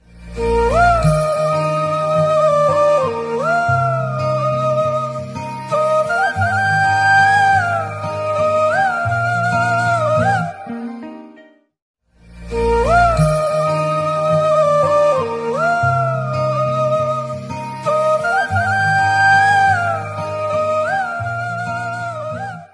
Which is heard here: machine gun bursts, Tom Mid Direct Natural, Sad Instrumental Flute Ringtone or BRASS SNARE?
Sad Instrumental Flute Ringtone